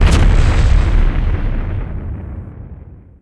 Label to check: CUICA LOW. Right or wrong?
Wrong